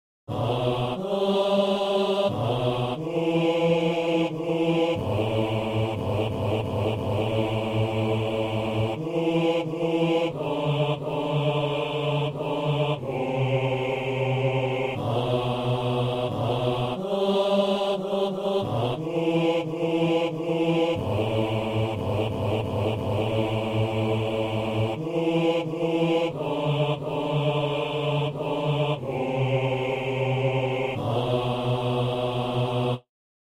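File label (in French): jésus nous croyons, basse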